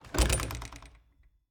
Cabin, front door, split door, oak, large, iron fittings, open, hyper; Eiravaein Works; Vaeyan IV